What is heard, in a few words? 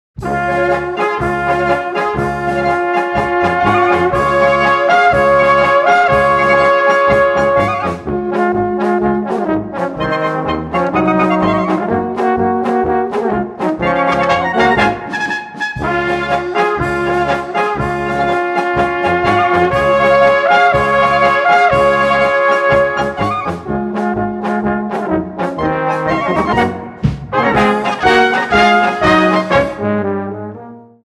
polka; Sillianer Beize